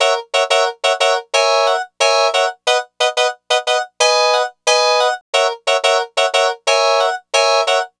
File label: Lounge Hrpsikrd-F#; Zero-G Kocktail Kollection; Construction Kitz (Lounge); Welk Lounge 090